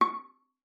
viola pizz C#6; Xsample VOL-5

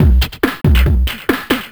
LoFi Beats, 140 BPM

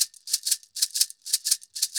Cabasa, Festa Latina, Drums & Percussion; Best Service; Festa Latina VOL-1

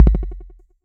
Kick Delay, TR-606 Bent Samples; Sonic Foundry (Sony Creative Software); The Electronic Music Manuscript Collection; Battery 3 Sample Kits

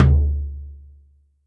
Surdo, Percussion, Yamaha DTXpress

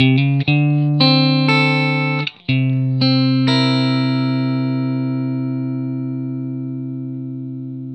TURN 1 G, Vintage Blues Guitar, 120; USB Soundscan vol.22; AKAI

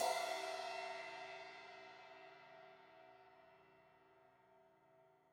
Crash 16in edge, Cymbals; Deeply Sampled Drums; Cymbals Stereo

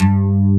AC GTR, JV 1080; Pro-Rec - Synthsonic

